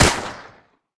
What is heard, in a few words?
fire; gmod; arccw uc commons attachments